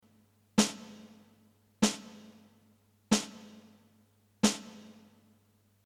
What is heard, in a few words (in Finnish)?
virveli